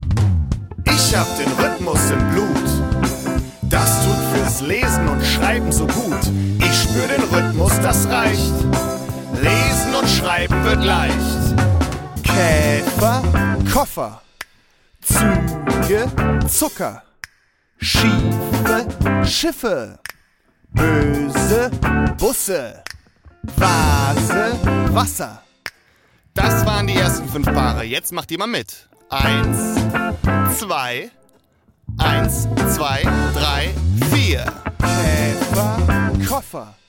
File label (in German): Rhythmus